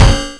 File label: sun Fence Impact; POOL CHAMP